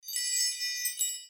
chime short

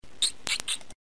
squirrel

